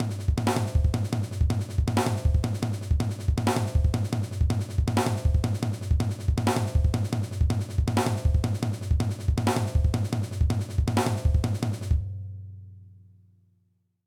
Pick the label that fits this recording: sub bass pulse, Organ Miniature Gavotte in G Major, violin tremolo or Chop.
Chop